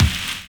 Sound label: RAIN BEAT; Univers Sons - Kitch 70s